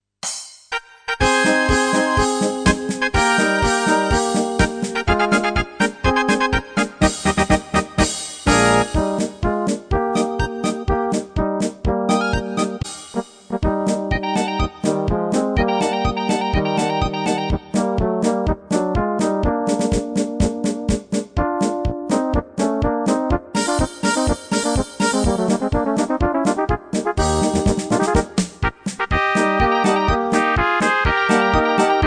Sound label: Polka